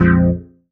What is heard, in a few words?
stab bonk F, Noise; stabs